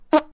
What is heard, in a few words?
fart